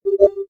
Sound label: NFC Good; Coolpad; C558